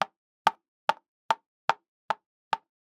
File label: plastic pawns on cardboard more steps; sonniss gdc